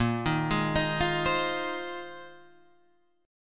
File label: Bb 7th Suspended 2nd Suspended 4th Bb down Guitar Half Step Down; arpeggiated; Bb7sus2sus4